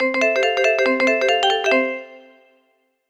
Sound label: Jingles; Pearl